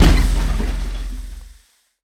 scrap Cannon Shot; WEAPON; Mechanic

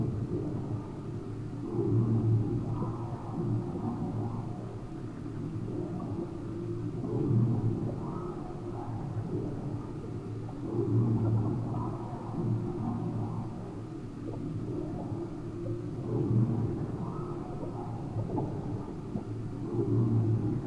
Biome Noise Loop - Europan Ridge; Music

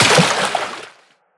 Splash, Water

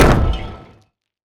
Damage alien Ruins Wall

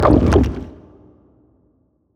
organs; Thalamus; CARRIER

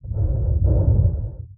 Drown Male

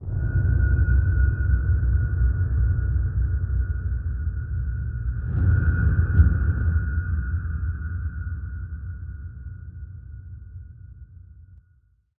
Ambient

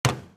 Footstep Metal; Footsteps

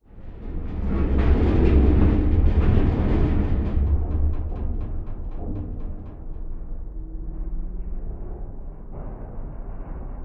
Creak; Damage

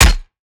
damage guardian pod impact; Alien

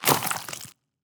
Gore; Damage

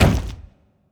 harpoon Through; Thalamus; CARRIER

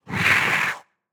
attack; Barotrauma; Crawler

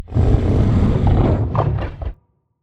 attack; Barotrauma; Charybdis

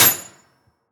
Metal Impact Light

